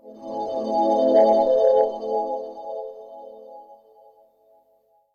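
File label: synth electro 140 A; Techno; Keys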